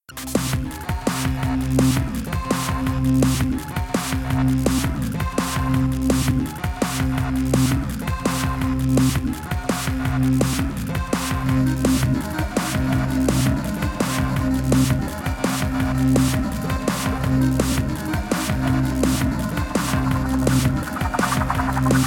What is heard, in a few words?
Danger Loop; traverser